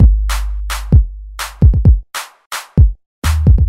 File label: BEAT